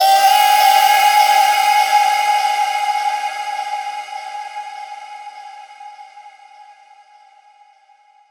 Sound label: Vocal Drop Starter (G-)